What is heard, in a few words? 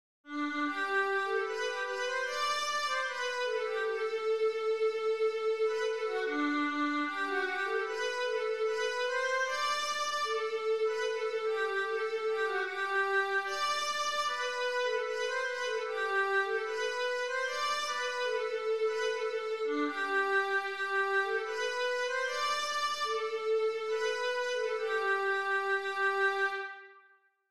simple gifts viola